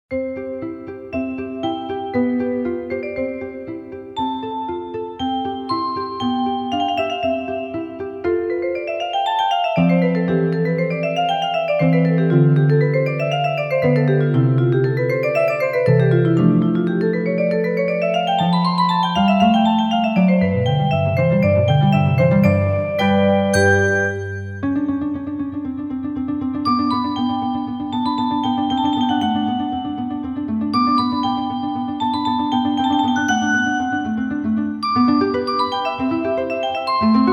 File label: piano sonata in C K545; sonata 16 dlja fortepiano do mazhor; volfgang amadej mocart